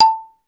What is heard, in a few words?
MLT XYLO